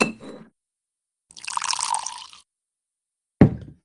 wine pour; barok

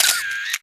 camera

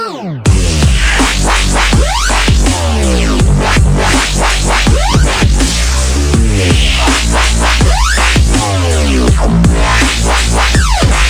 epic wubs

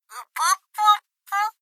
upupupu; monokuma

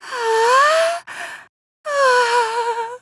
haaa; toko

